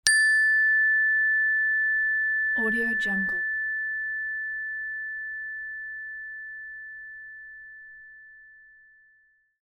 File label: dinging sustained